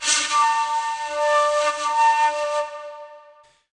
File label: Shakuhachi reverb